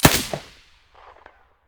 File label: sa58 silencer stereo